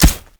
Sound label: Rush Step; Warframe; Runner